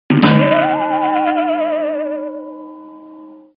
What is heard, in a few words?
death sfx; cup head